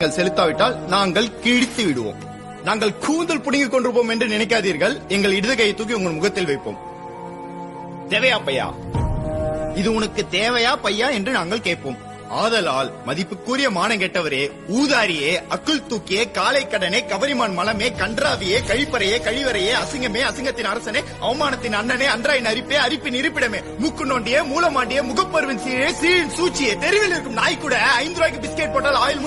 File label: tamil song